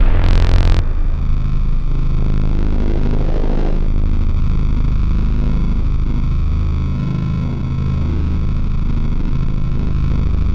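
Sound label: science fiction Bot Glance, Cinematic Metal Impacts; boom library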